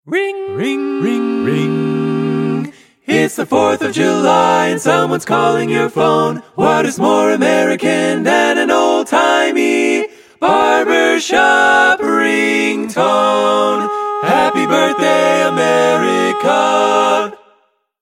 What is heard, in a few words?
barbershop quartet ringtone